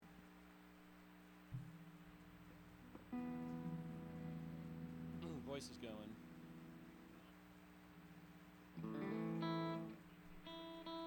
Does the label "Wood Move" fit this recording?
No